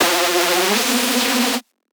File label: synth screech oneshot F dry; Synth Hits; GhostHack